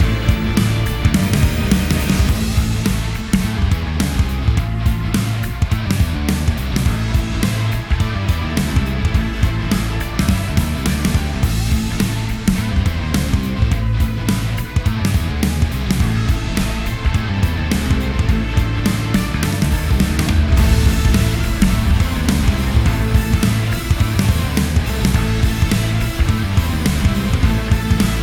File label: Battle